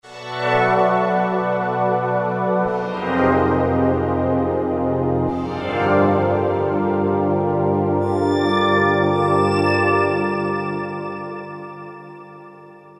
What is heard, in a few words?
Pulse Pad; Roland PN-D50-01